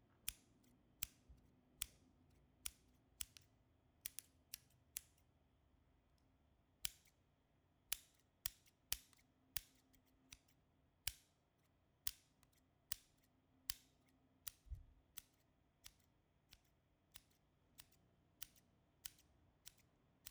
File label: Scissor Explo; Gwen Explorations